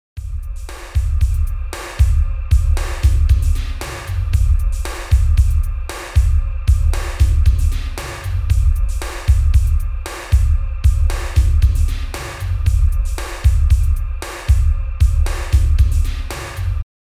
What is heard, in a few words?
bass beat